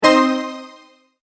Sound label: multimedia tone start; Sound Design